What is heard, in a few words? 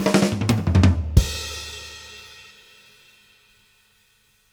Fills, Monster Beats; Zero-G